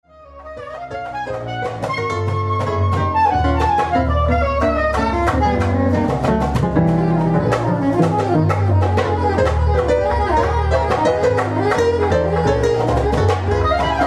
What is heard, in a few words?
sax session